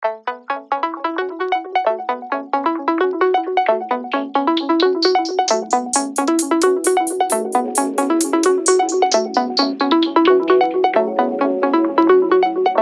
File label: ringtone; nadeshiko pink; Sailfish Ringtones